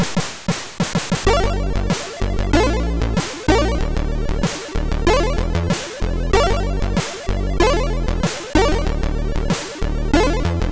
Relaxation, NTSC MOS6581R2 sid

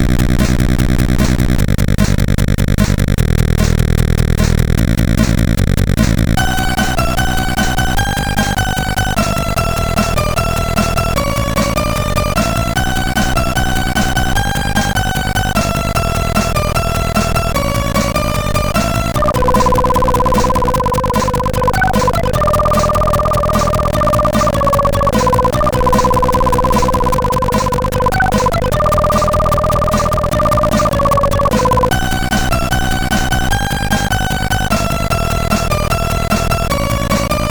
Fast Loader, sid CSG8580

